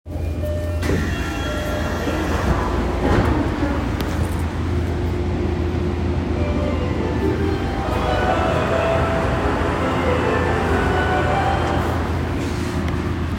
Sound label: train sounds; 日比谷線小伝馬町南